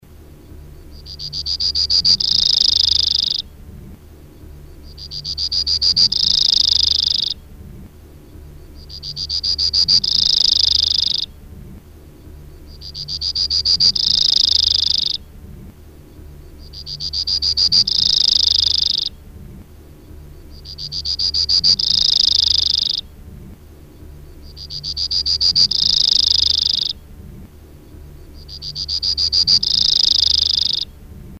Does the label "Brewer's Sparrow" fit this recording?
Yes